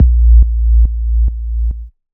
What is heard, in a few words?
Bass, Dubstep 808 Basses; GhostHack